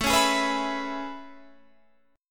A Major 9th A

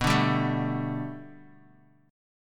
B Minor 7th Sharp 5th B Bass Standard, chord; Bm7#5 strummed; JGuitar

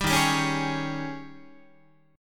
D sharp 11th D sharp, Guitar; strummed; 10,11,10,11,12,12; JGuitar

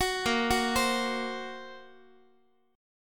Bb Suspended 2nd Sharp 5th Bb Guitar; x,x,9,8,10,11; arpeggiated; 4A,4E,5A,5D,5G#,6C#; chord shape; JGuitar

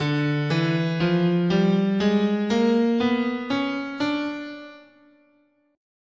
D Diminished Whole Half, guitar, scale

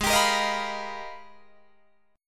Ab Minor Major 13th, down, Guitar, DADGAD

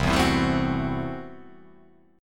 C 6th Flat 5th C down

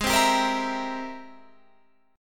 Ab Augmented Major 9th Ab Guitar Standard; strummed; Ab+M9; JGuitar

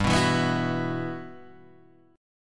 E Suspended 2nd G; Esus2/G strummed

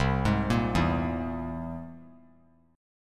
C Augmented 7th C down 3C, 3F, 3A, 3D; arpeggiated; JGuitar

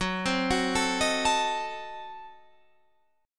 F sharp Diminished 7th F sharp Guitar Standard; arpeggiated; JGuitar

